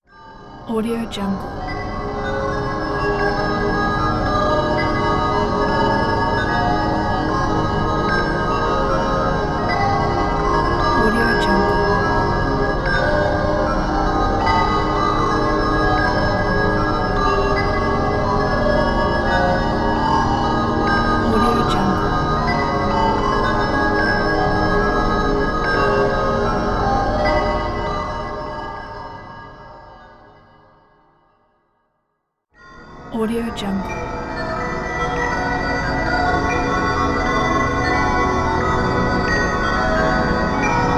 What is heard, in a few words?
scifi bells